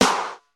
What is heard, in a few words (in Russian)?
malij baraban odinochnij pop